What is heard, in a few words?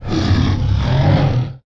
Frostwyrm yes; 冰霜巨龙（魔兽争霸3）; 亡灵; Wyrm Breath; 冰龙吐息; 攻击时